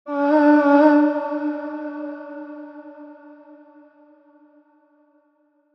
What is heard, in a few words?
Chant, Vocals